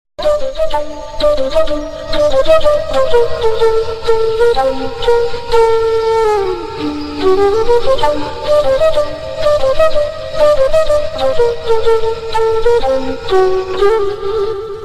flute ringtone

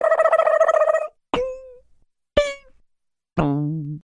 ting ting tong sms tone ringtone; sms ringtones

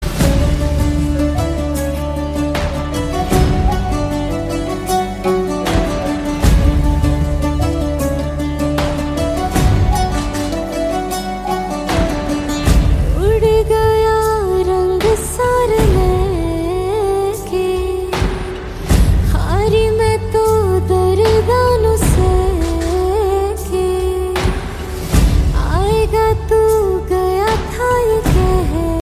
awesome bollywood song tone ringtone

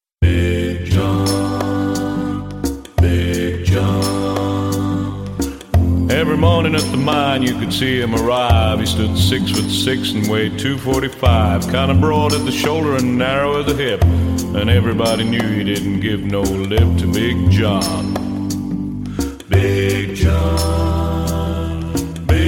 english song ringtone

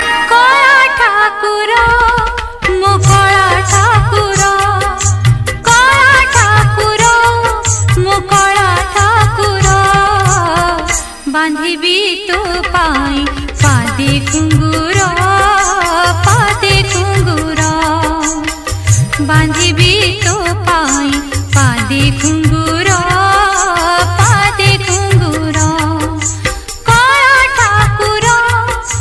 punjabi ringtone

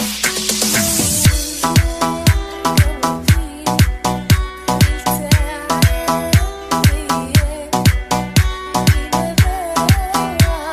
arabic remix ringtone; islamic ringtones

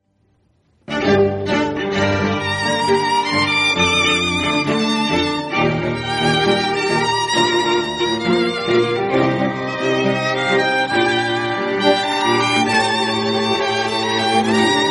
music ringtone

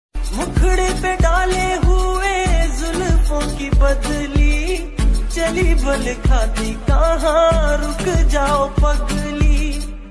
sweet bollywood ringtone

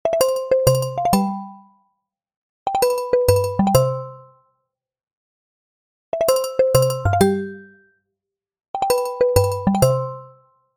karimba ringtone, iphone ringtones

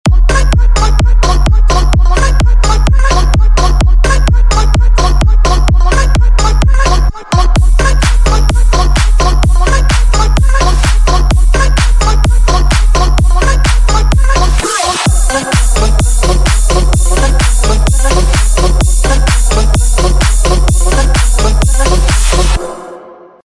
bounce ringtone

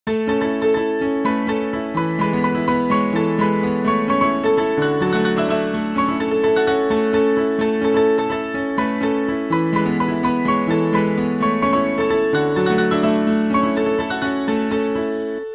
piano ringtone

